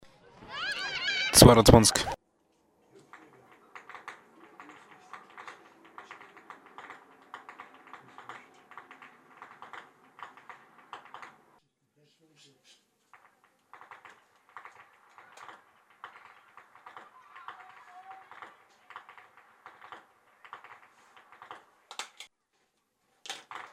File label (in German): schnalzen; surheim; saaldorf